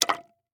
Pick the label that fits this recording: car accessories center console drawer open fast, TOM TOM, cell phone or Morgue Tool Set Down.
Morgue Tool Set Down